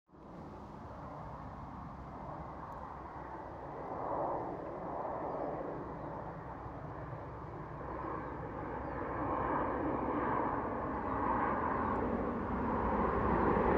Overhead Jet, Passing jet aircraft overhead from left to right.; Airplanes; Vehicles